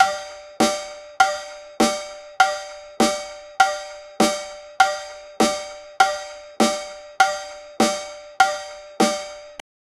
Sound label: serious mridangam beats